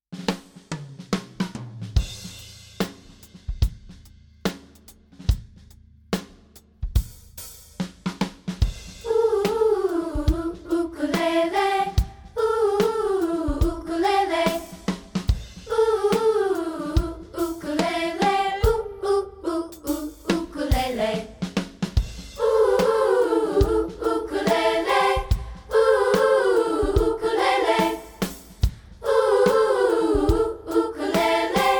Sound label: Hip Hop Ukulele Drums Vocals